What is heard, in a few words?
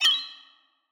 graphics high short glitch; soundscrate